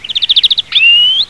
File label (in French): verdier; le verdier d'europe chant 01; C'est le mâle qui présente une jolie couleur vert olive.